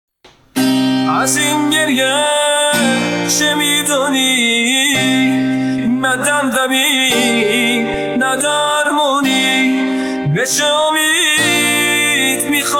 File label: Guitar Version